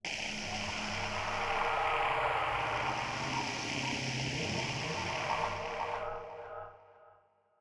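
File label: Noisey Atmos, cinematic soundbeds; Noisey Artmos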